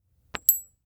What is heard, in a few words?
Impact Coins Coins Drop On Cloth; Impacts